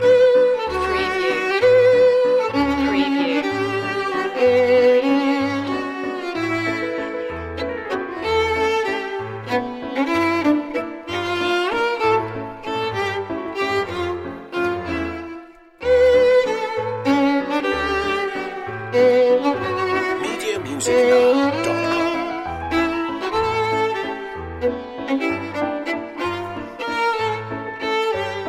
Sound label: waltz loop; Ballroom